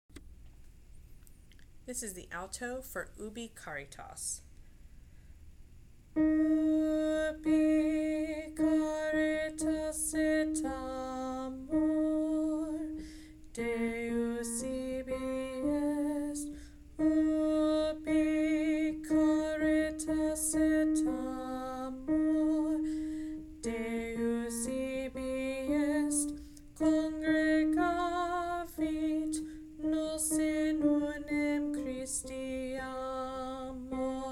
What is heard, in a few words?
Ubi Caritas alto